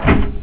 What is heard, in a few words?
switch